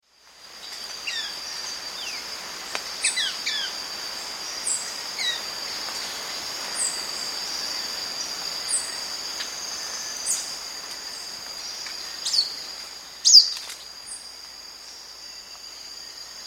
Plain-Winged Antwren, S. America

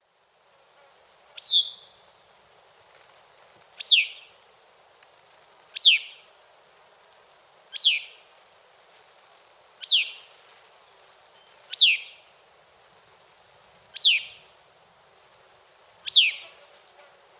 Catharus minimus, calls; S. America; Eastern Lowlands

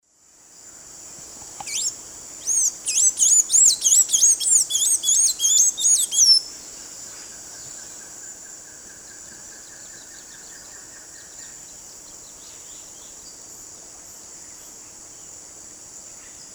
Thraupis episcopus, song; S. America; Eastern Lowlands

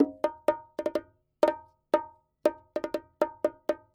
JAZZ TRAP KIT

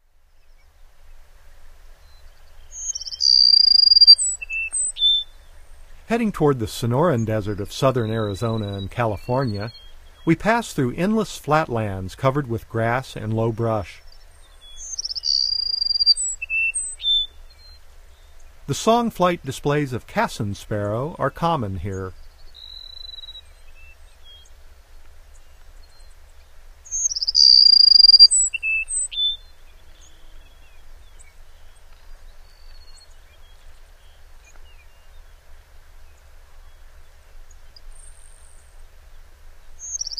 Cassins Sparrow; North America; Common Western Species; Know Your Bird Sounds

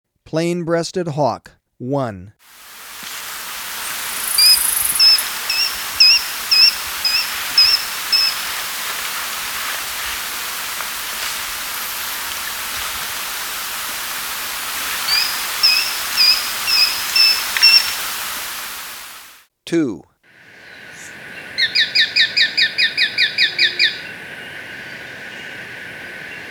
Plain-breasted Hawk, Birds of Ecuador; S. America

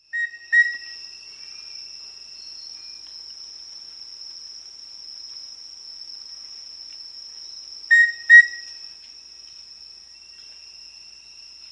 Rufous-Breasted Antthrush, S. America

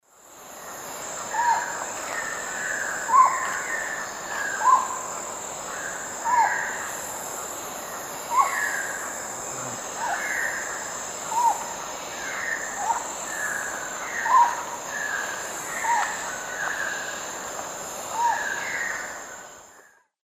Psarocolius yuracares, calls; S. America; Eastern Lowlands

